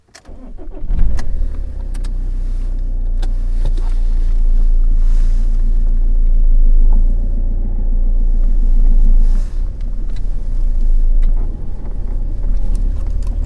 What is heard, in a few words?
Inside Diesel Car Ignition Engine Start